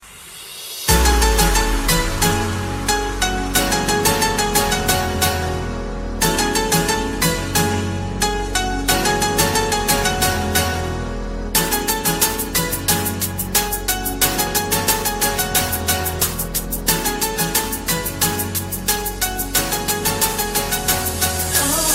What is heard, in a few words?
piano tone ringtone; hangover